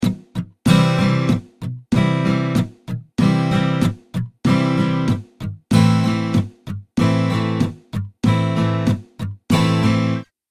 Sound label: strumming pattern